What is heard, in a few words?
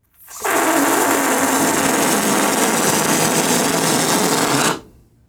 balloon deflate long